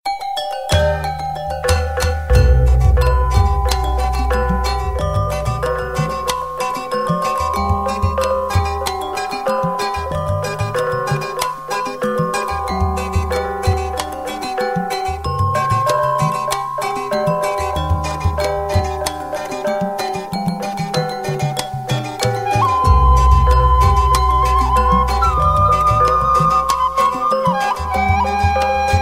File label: Sundanese